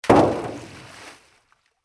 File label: impact metal water trough, Impact-Rock Into Water (Trough); Impact - Heavy Stone / Rock Thrown Into Water Trough - Water And Metal Impact; Rocks; Materials